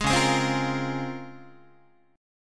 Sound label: C sharp Major 13th, Guitar; C#M13 strummed; JGuitar